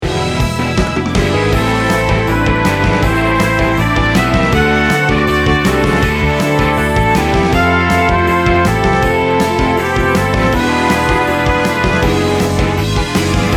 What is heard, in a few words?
battle